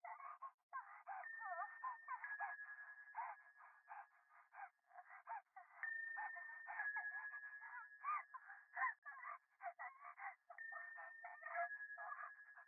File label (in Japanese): 電話 可愛い喘ぎ 神社